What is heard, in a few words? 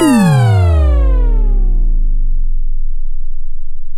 SPACE LAND; E-MU Formula 4000 Series Vol. 2; Effectors